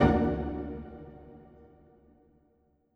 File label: Strings Hit Pizzicato